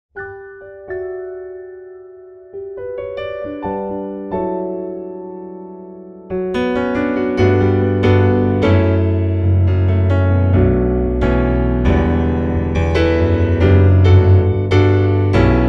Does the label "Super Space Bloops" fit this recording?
No